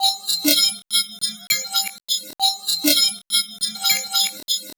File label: Electronic Percussion